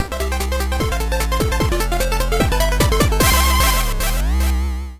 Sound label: Jingle; TASBot